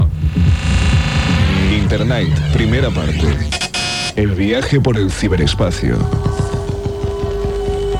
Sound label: bumper; Internight; My Radio Nights in 1997; Sampler